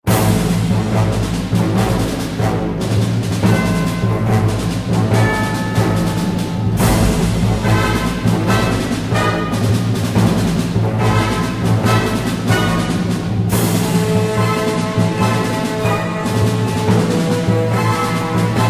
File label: battle